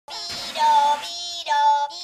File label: siren; minion